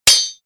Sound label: sword knife hits